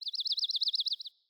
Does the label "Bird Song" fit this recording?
Yes